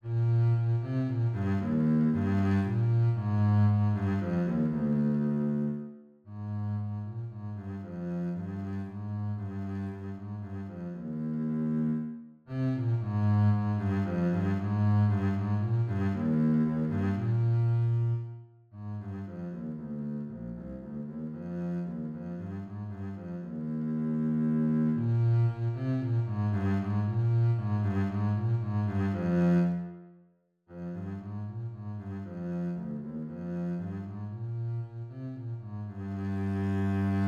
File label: String Bass